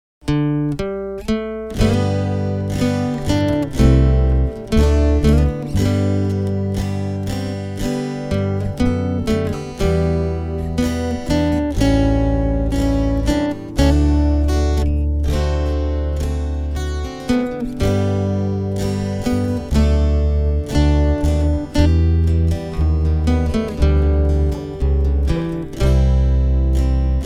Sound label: Acoustic Version